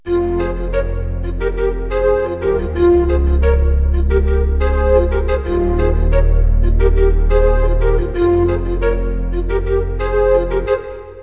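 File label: synth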